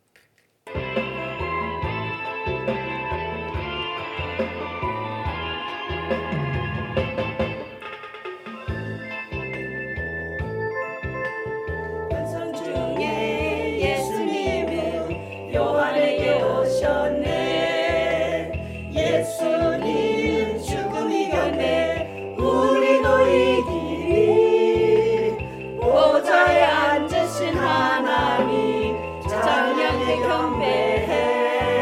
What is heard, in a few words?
Korean A New Heaven and a New Earth Song; Sae Haneulgwa Sae Ttang